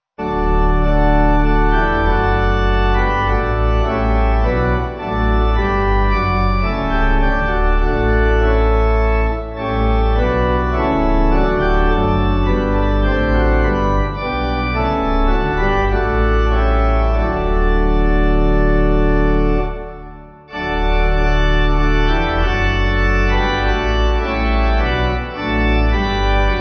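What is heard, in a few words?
Pipe organ, church music backing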